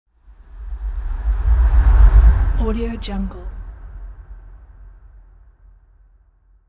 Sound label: low dramatic transition; alexander blu